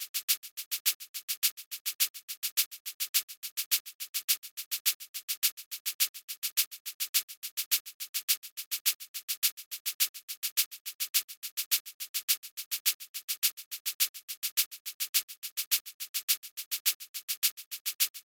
HiHat Loop

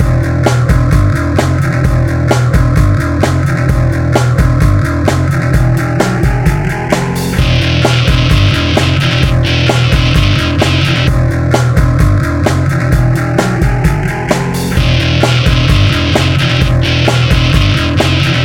PopRock Beat

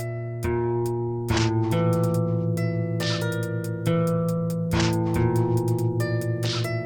Kung Fu RnB No Beat

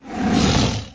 Lion Roar Sound

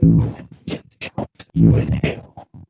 Beat Box Vox

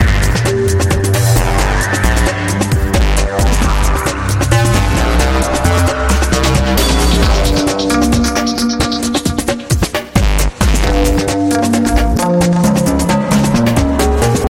Hybrid Electronic

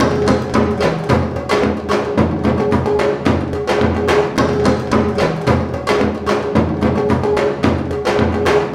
Japanese Drums

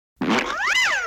Cartoon Sound Effect

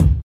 Perfect Kick Drum

